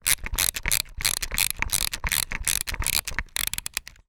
toy plastic wind up release run; zapsplat

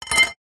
metal pole small set down wood floor; zapsplat

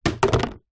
food drink smoothy bottle knock over on surface; zapsplat

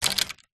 leisure fishing road drop ground; zapsplat

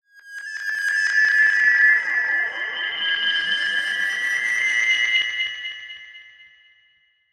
sound design mysterious shrill melodic tone

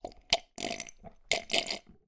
human slurp drink from cup with gulps

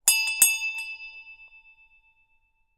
bell school handheld ring x2 gentle

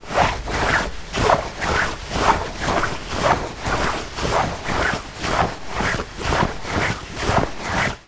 foley denim jeans walking slow movements; zapsplat

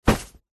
foley tent in bag large heavy set down; zapsplat

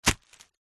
foley footstep single on plastic sheet

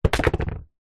roller skate fall over; zapsplat

